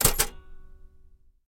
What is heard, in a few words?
Typewriter Shift Ke